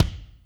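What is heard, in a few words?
kick Amb 22 Pwr, Alesis D4 drum machine; 300 Drum Machines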